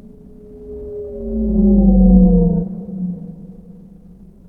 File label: whale hurt